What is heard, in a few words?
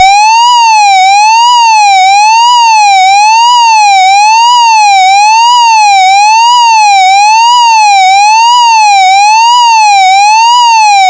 Sweeping UK